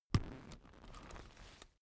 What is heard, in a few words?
umbrella telescopic close slow